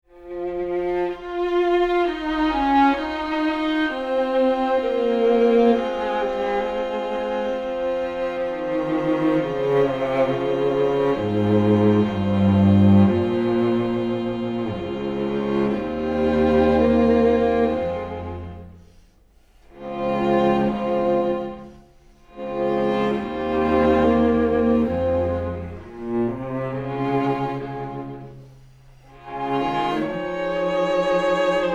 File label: String Quartet